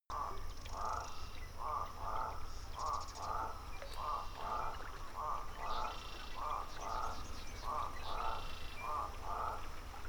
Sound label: swamp loop day